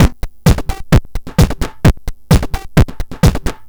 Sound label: Crushed Beat 130BPM; musicradar